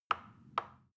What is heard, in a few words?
plastic button tiny click soft slow